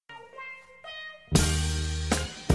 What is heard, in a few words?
sensual musics